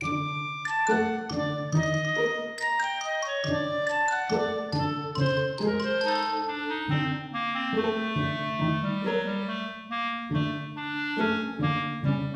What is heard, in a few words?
witch hut; zsdx